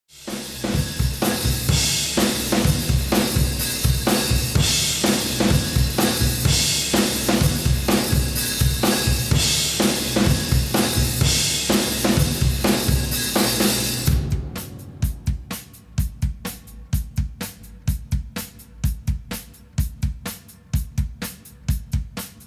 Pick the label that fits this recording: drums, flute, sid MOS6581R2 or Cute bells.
drums